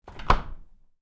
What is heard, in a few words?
fridge close, kitchen